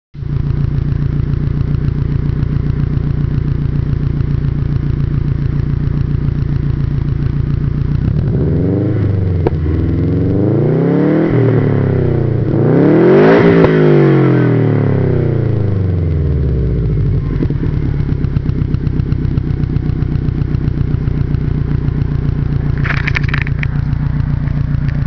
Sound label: subaru new sound, SUBARU Impreza; auspuh